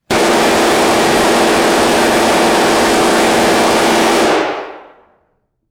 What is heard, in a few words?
fully automatic battle rifle burst indoors; guns